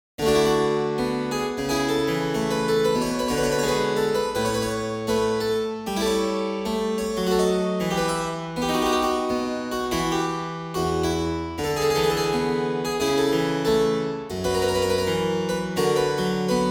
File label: Gavotte; Couperin; Giusti; pianoteq8; karsten